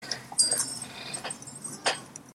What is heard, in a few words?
bell